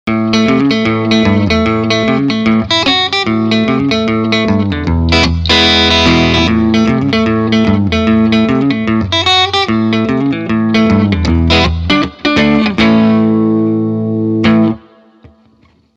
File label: Gretsch G6129 Neck clean